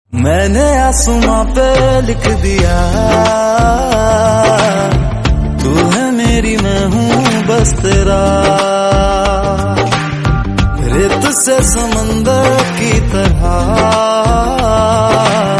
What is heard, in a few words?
Hindi